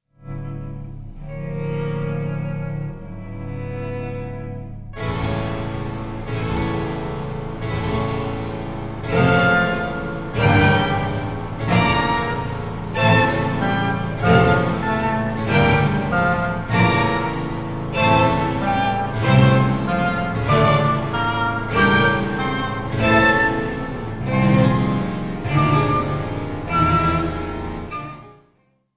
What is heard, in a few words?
harpsichord concerto; manuel de falla